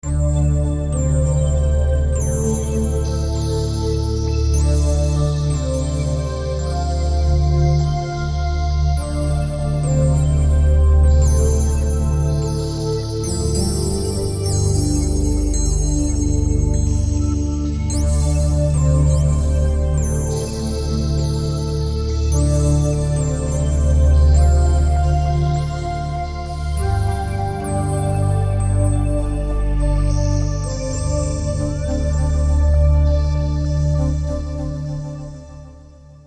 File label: Life in a Drop Looping